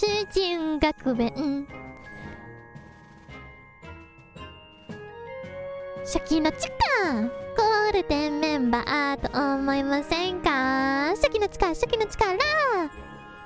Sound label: Singing; IRySinging